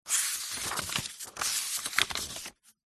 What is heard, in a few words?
household book diary page turn